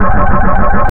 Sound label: sci-fi machine game; machines